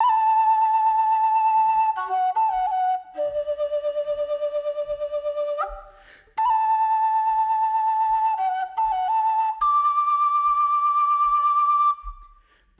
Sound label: Flute A, 075 Bmin; Apple Loops; Big Fish Audio